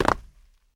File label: concrete land; Original TK Sounds